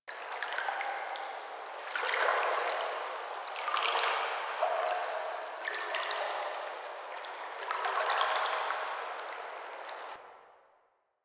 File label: Cave Waterlap Loop; Ambience; Warframe; Eidolon Plains; Eidolon Landscape; Caves